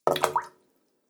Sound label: Water Hit And Plop; Dripping Sound Effects; Agcnf Media